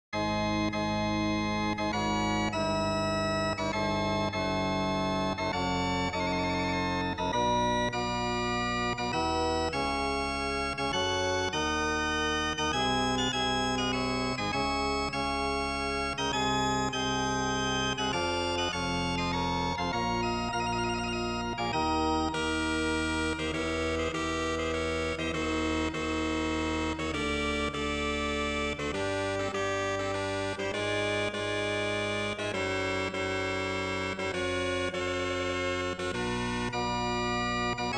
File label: Prelude; Lemba Suite